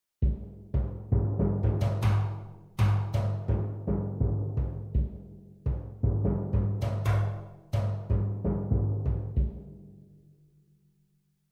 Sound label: ethnic drums part 2